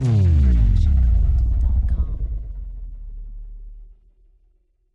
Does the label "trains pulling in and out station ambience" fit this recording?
No